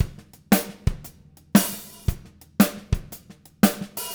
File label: Living Drums; Sampleheads; Peter Erskine